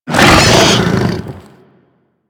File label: Infested Ancient Attack Grunt; Warframe